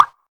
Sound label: normal hit whistle; izduh v4red temu